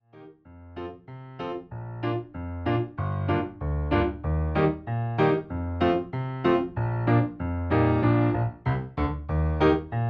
instrumental bez linii

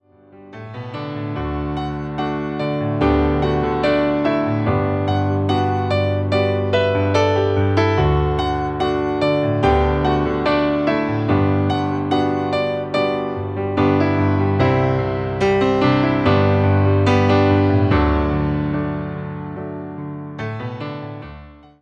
instrumental z linia

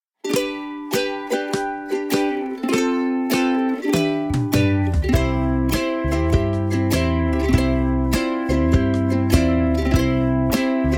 wersja instrumentalna

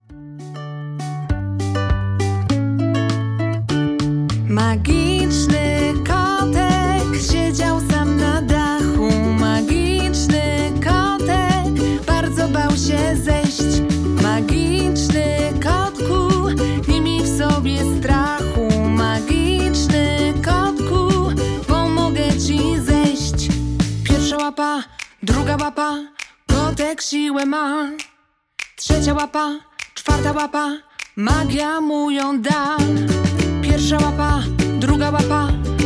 wersja wokalna

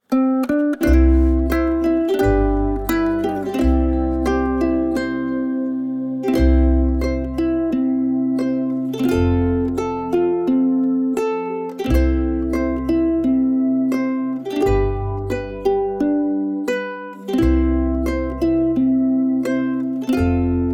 wersja instrumentalna

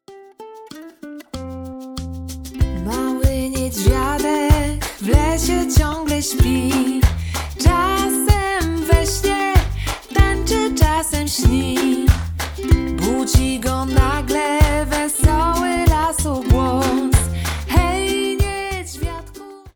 wersja wokalna; mini pakiet

